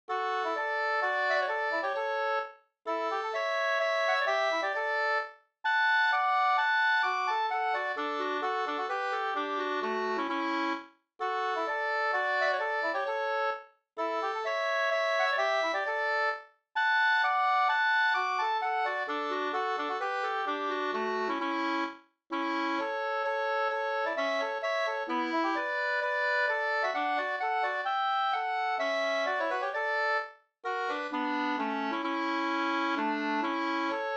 menuett; fr Overlulea LouisM55p18 2st

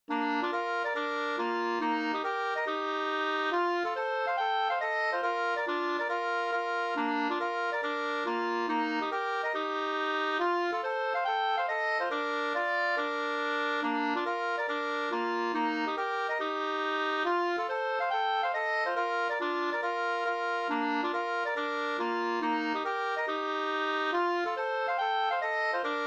schottis; Lidstroms schottis An 2st

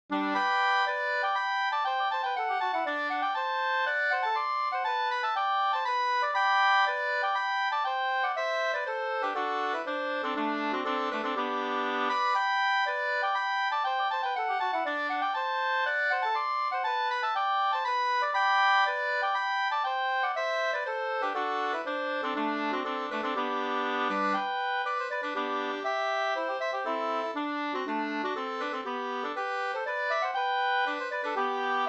hambopolska

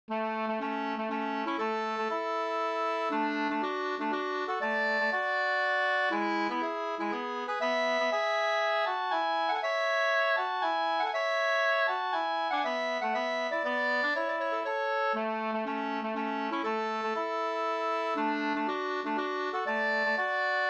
polska Bodapolska; Finn Anders polska 2st

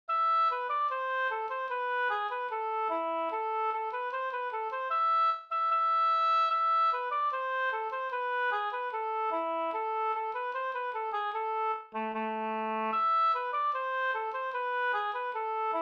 brudmarsch brudlat

